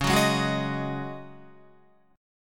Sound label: C sharp Suspended 2nd Suspended 4th, Guitar; C#sus2sus4; strummed